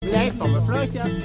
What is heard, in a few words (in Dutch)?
Fluitje